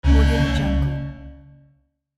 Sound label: cybernetic alarm